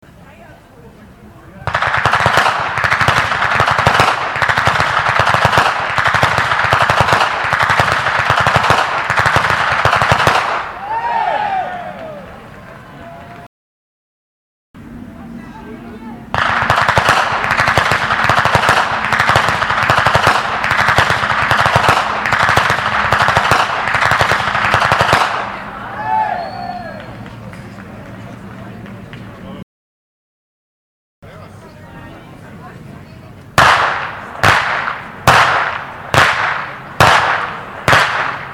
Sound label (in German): Schnalzen; Ainring; Ainringer Schnalzen